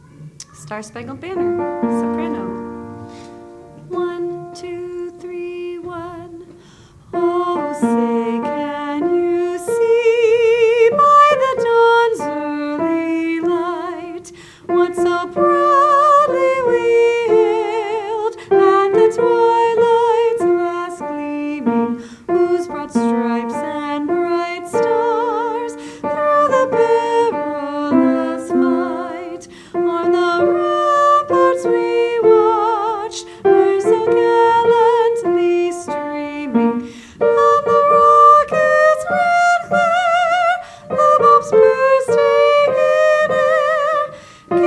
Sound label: Sop SSB with voice; Rosemont Ridge Middle